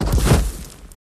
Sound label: Horse Hoof Heavy